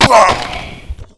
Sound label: death